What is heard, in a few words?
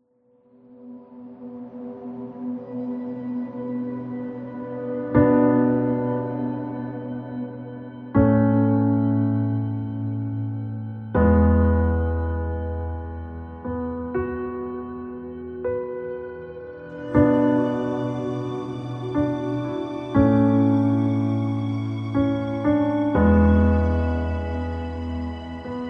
Ambience